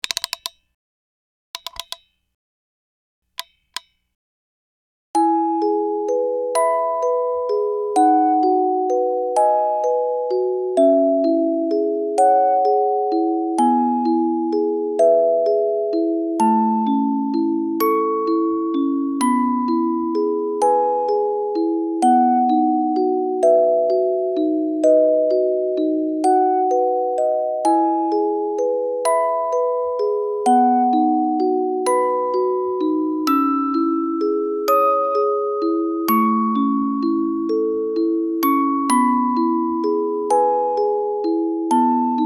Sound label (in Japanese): イメージ曲 musicbox; ２冊目 ～夏～